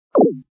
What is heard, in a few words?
button over; sumo snowman